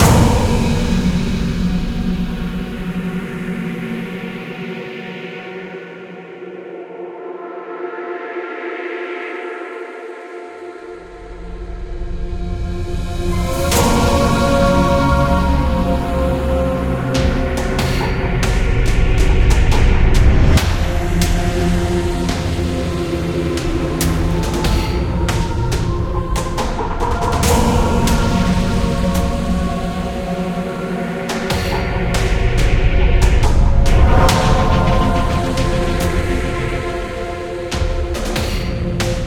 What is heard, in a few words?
Game Over; Towaga; Android